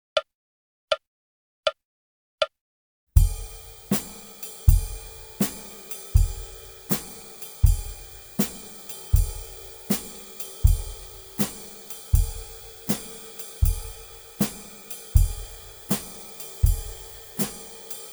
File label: Jazz Swing 80 bpm, Guitar; Guitar Aerobics Disc 2